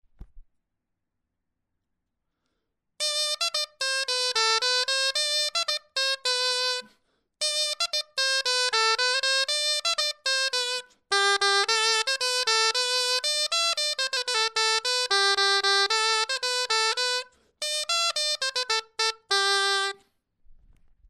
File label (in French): clarin Bourrée; du couserans